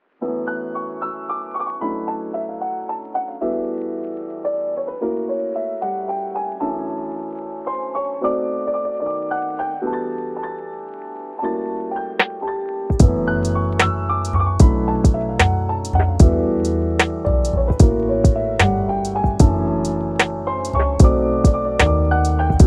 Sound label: Smoothjazz